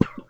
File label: Minimize; Linux; KDE Borealis